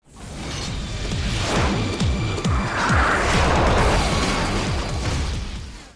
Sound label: Start Windows; Lara Croft- Tomb Raider- The Cradle of Life